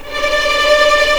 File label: D5 TREM, VLNS TREMOLO, String; East West; The Ultimate String Collection